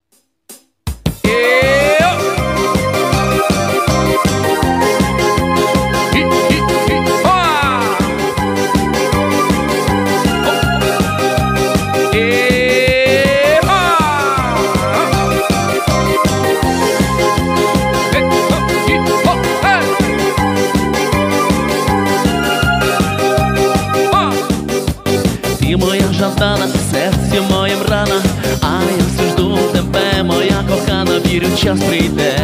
dance

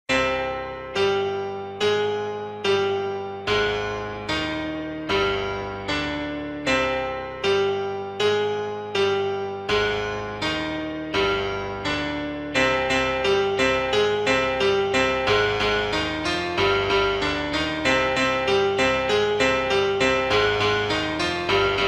hardstyle

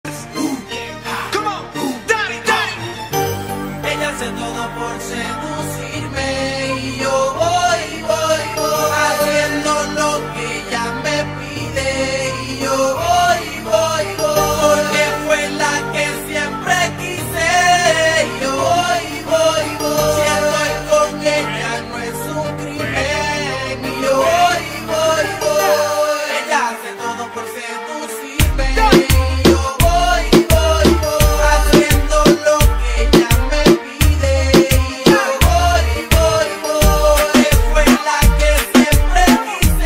slowed and reverb

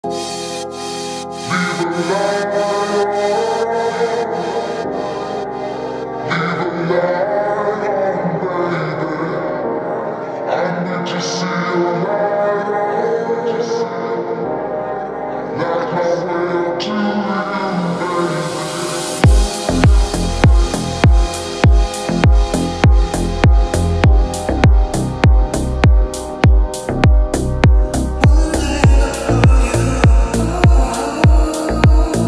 deep house music

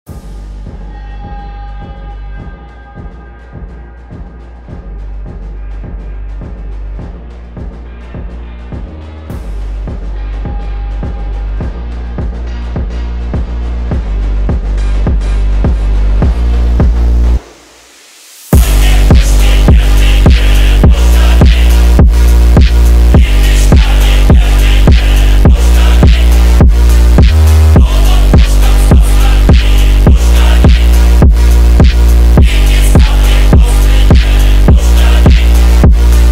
phonk remix

